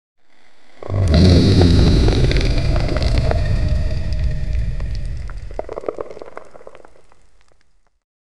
flaming wolf roaring with

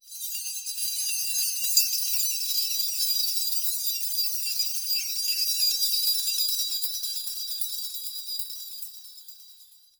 golden magical springle, glitter

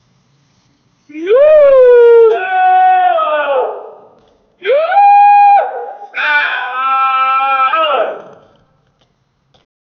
tiger shouting; feline

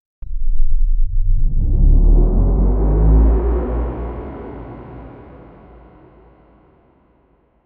playstation 2 bios start, startup

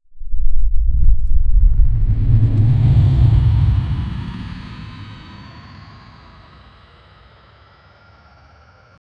tonal startup sound

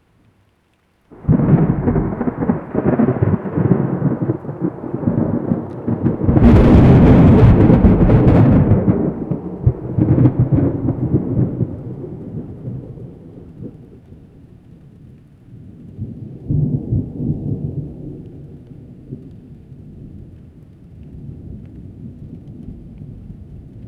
thunder through mountain

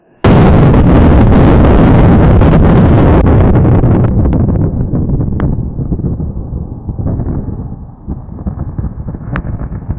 fireball being created

